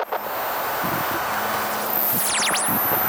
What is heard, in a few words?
psynoise; Devices of Anomaly Redone; catspaw